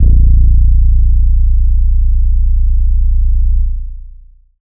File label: Tonal Hit